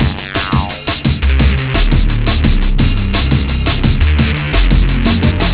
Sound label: sniper